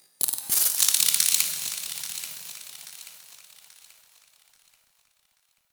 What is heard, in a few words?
Metal Movement Texture Small, Corpus Ship ambience